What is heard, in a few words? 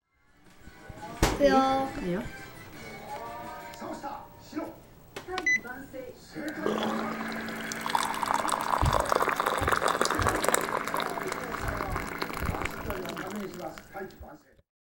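pouring hot water from the pot into a cup; ポットのおゆをコップに入れる音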